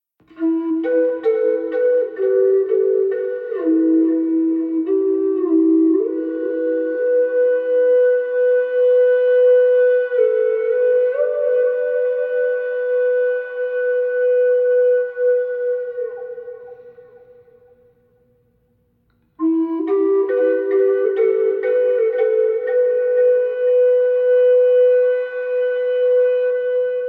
Soothing Asian Flute

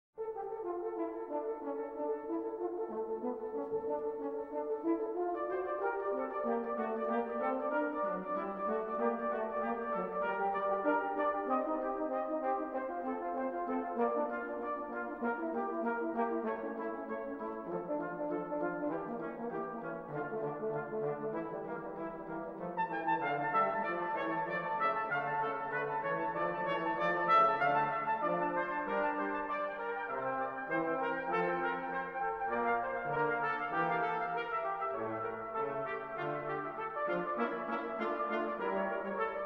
Brass Toccata And Fugue in D Minor Fugue; German; BWV 565

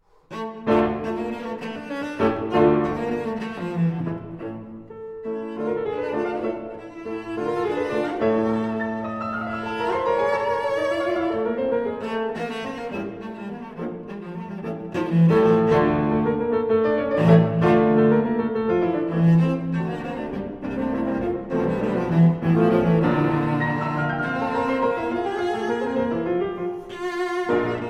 Cello Sonata in G Minor; Op. 65 II. Scherzo. Allegro con brio

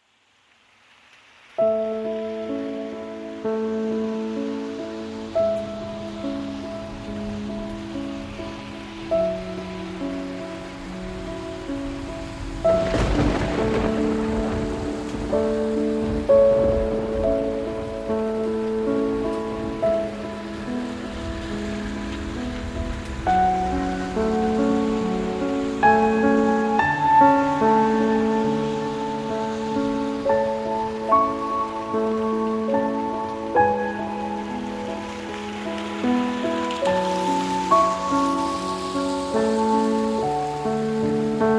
Piano and Rain

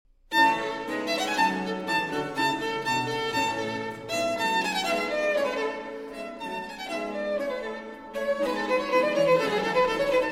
Violin Sonata in B-Flat Major, Allegro; Sonata F.XIII no 16, RV34

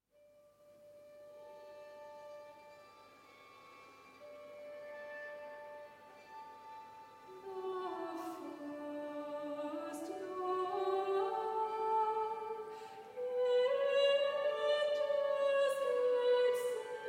Solo Cello, Choir and Strings; The First Noel

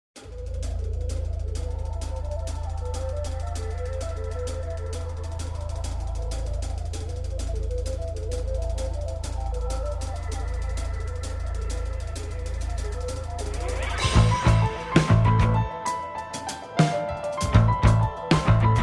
Motorbike

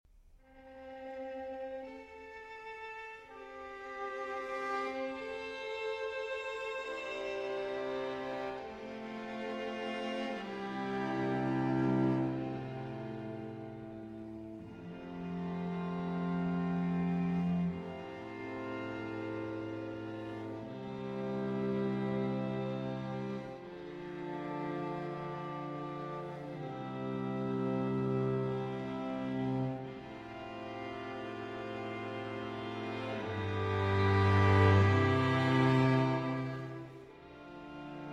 String Quartet in A Minor, Molto Adagio; Op. 132

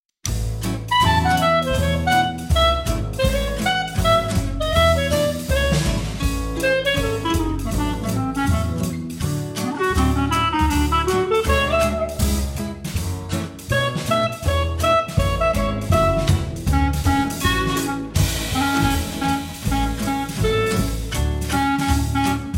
Morning Jazz

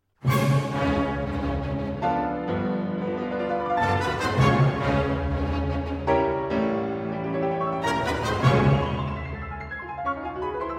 Piano Concerto; Piano Concerto No. 1 in F-Sharp Minor; III. Allegro Vivace

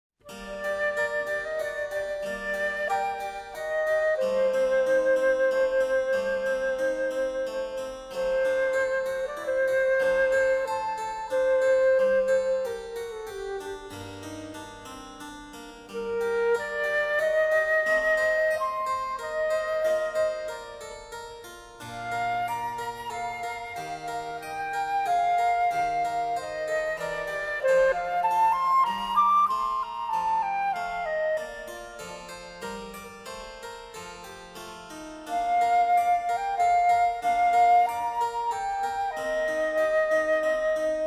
Flute Sonata in E-Flat Major Siciliano; BWV 1031

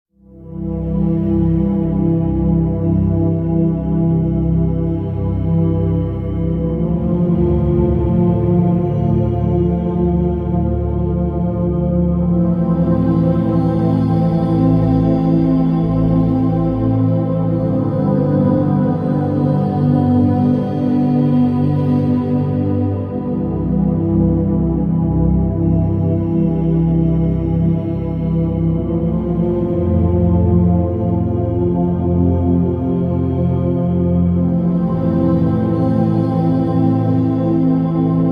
432 Hz Healing

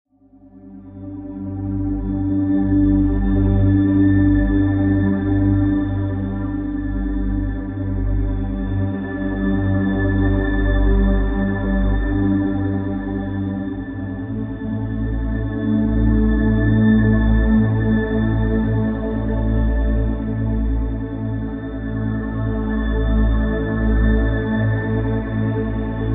Seed Mantra 182 Hz

